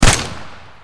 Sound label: Deagle